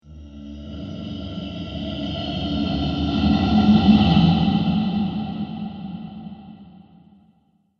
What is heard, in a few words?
Ghostly Halloween Monster